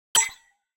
interface cursor click; exointerface

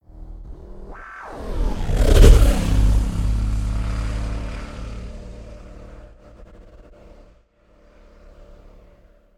designed passbys the freq shifter; sonniss gdc

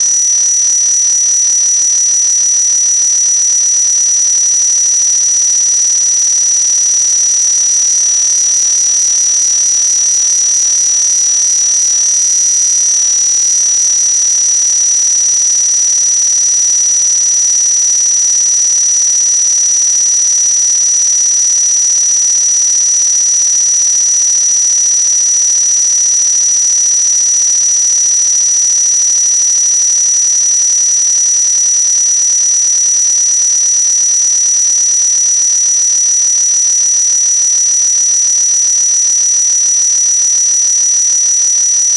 electrical interference crackle; sonniss gdc

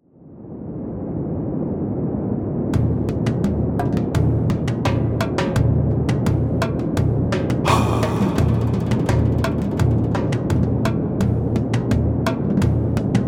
ambiance, music; alkazaars appendix

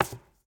put book on shelf; sonniss gdc

